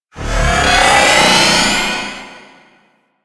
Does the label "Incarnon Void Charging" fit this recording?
Yes